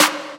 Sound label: Snare; drum